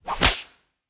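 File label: whip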